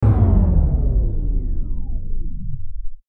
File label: Descend Power Down; Descend Power Down Phase; Blastwave; Descends; Sound Design